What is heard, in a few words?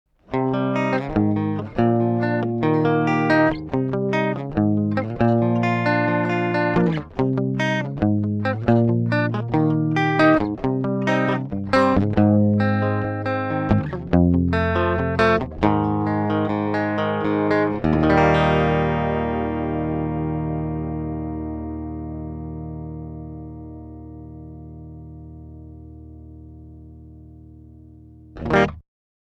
chorus